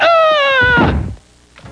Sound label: ahhh!